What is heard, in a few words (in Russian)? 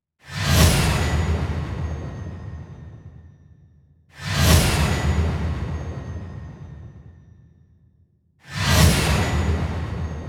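набор кино и телевизионных спецэффектов переходных звуковых эффектов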